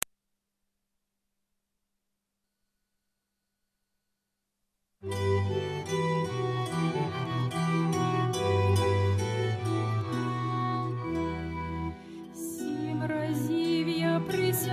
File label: Ukrainian love baroque song 18 century